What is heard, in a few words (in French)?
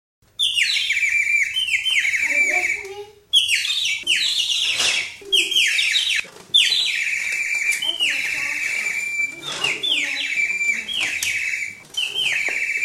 Rossignol